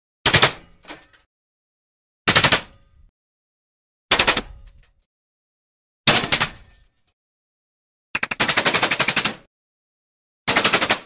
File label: Bullet Impacts Metal Multiple Bullet Impacts; Hollywood Edge Foley Sound Library